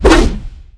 Axe; Weapon

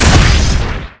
Weapon Axe Hit

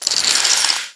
Magic Ice Hit

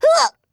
Christmas Melee HIT

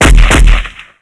cannon attack

